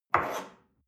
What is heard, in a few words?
espresso grab from table slide soft, restaurant cafe